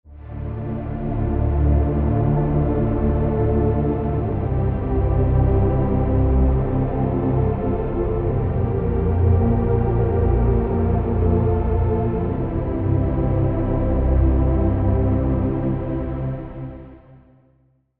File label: Pad; Temporal Rift; Routed Sound; Vital Expansion - Star Scavenger Vol.1